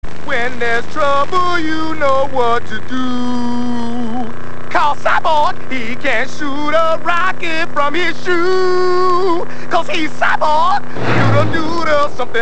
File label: cyborg sings